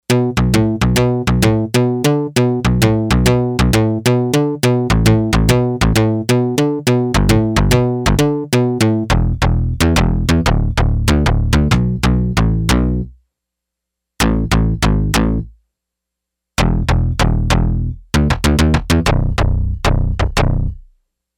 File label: Synth Bass